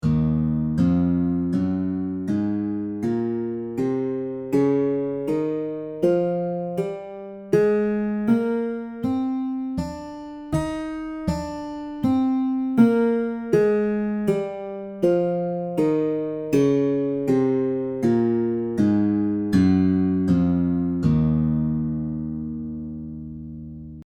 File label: Minor Tetrachord